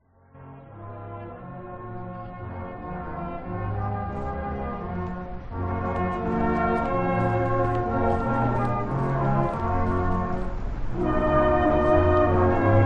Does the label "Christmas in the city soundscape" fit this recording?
Yes